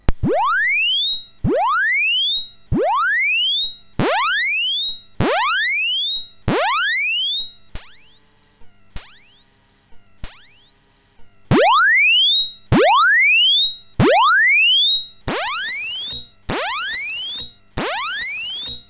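SIDBench MOS 6581R3, SIDBench shows distortion on the Saw+Pulse filter; Week 10 1986 Philippines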